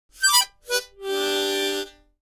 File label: Mouth Organ Shrt, harmonica